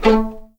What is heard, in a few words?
A3 PIZ MF, string; East West; The Ultimate String Collection